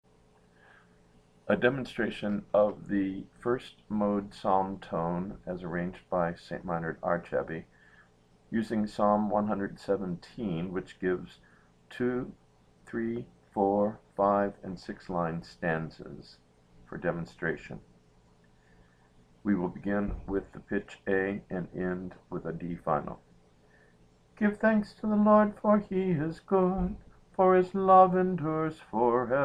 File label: psalm tone i